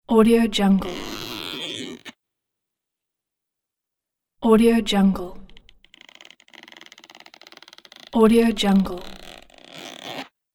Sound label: zipper